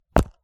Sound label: pas